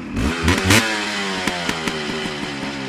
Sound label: accel